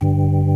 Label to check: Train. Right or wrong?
Wrong